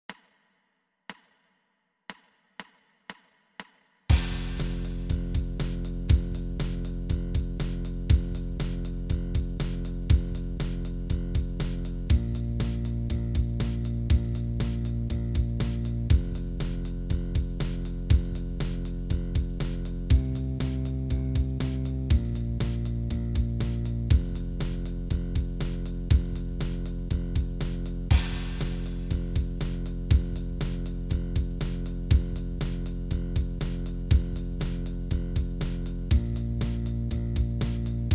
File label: bass startup blues 120; f blues